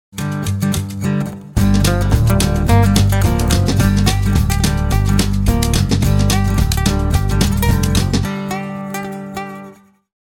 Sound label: DESPERATE BLUES